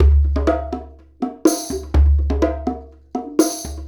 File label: Combo, Essential Percussion